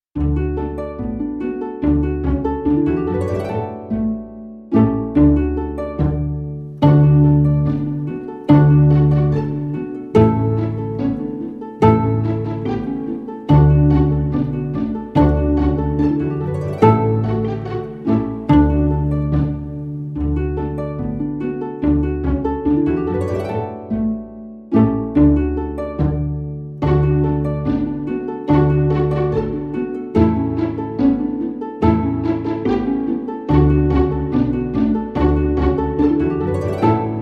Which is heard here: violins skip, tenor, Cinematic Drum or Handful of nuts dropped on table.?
violins skip